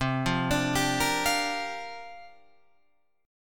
C 7th Suspended 2nd Suspended 4th C down Guitar Open F; C7sus2sus4 arpeggiated